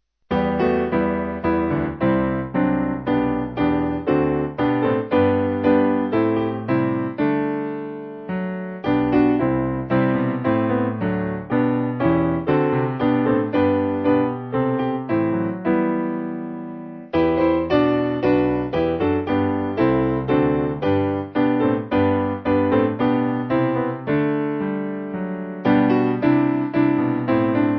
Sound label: Piano; O Jesus Crowned; Kingsfold